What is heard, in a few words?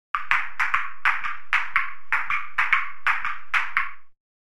Ping Pong